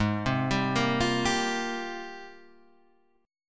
G sharp Major 13th, Guitar Half Step Down

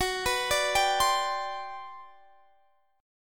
G Major 11th Gb

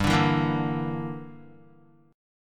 G Minor 6th, Guitar; Gm6 strummed; JGuitar

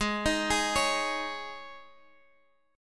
Gsharp Suspended 4th

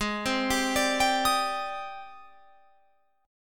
G sharp Major Sharp 11th, Guitar Open F; G#M#11; 20,19,19,21,22,22; arpeggiated

